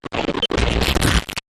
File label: Gritty Static